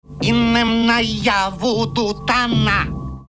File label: Voo Doo Chant